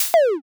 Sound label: delete